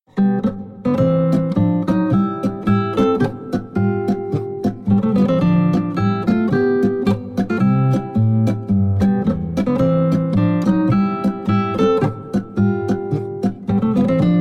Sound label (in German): polka; meissnitzer gmiatlich